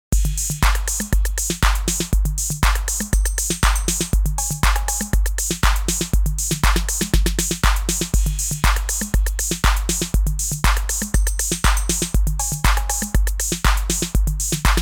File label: 808 clone